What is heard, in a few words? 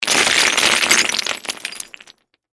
Crash Brick Debris, Crash, Brick, Debris, Large, Dump; Crashes (Sound Effects:Objects); SoundEffects-BlastWave